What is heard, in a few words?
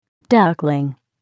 duckling